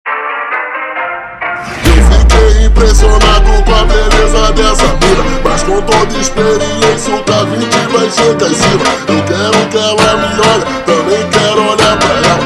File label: Funk ultra Slowed